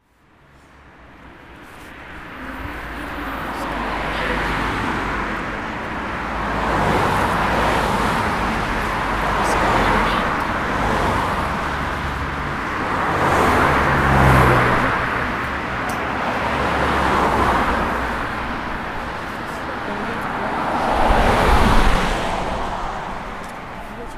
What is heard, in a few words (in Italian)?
Volano traffico ss12; Alta Vallagarina; Suoni Ambientali; Portobeseno